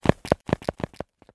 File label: MANNEQUIN RUNNING; don t be afraid the first toy